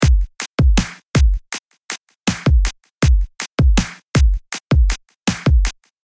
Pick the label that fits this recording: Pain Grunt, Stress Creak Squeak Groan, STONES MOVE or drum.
drum